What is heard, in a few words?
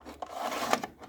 VHS input